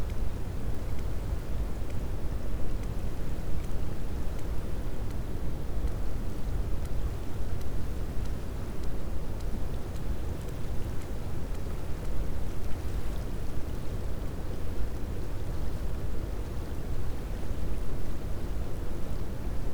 cachalot positif, Physeter Macrocephalus

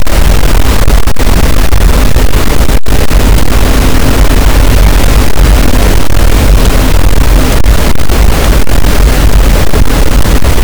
cachalot, Physeter Macrocephalus, gaussian noise

